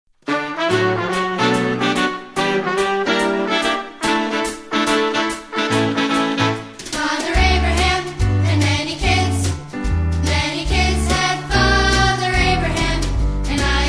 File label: Vocal